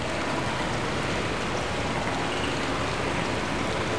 canals; KINGPIN

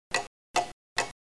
ticktock; Digital Sound Studio

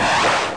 whoosh; The Best of Select Top 50 Volume 1; wildride

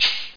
BUTTON

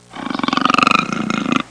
puma growl; jungle animals

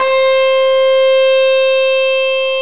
grunge guitar

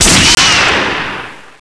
Button Click

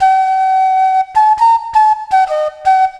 flute, ethno; eJay

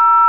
TONE

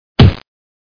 punch; HUMAN; FSSD SoundEdit Mac Sound

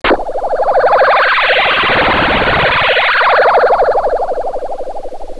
spaceship, electronic sounds; Sound Effects for Multimedia - Volume 1

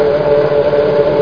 Menu Command

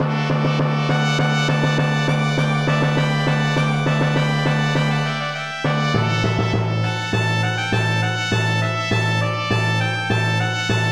FANFARE

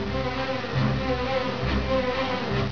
dramatic; Space Shuttle - The First 100 Flights

